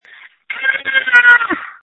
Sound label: Scream